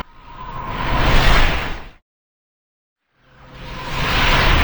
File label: emote laugh; Xerath